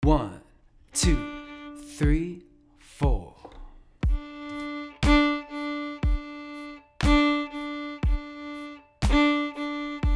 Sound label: offbeat accents hoedown open d, fiddle; offbeat accent patterns